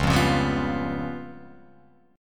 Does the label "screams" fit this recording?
No